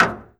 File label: hit; osirion